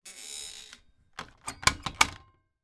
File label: household stair gate close lock; sound effects one